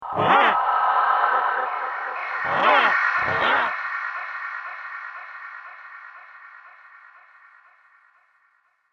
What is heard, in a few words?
Disturbing Zombie Parrot; Zomby Sound Effects; horror sound effect; Andrew Fleming